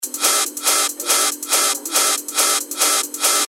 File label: 140 BPM, techno loops; Henchman; revealed techno top loops vol 8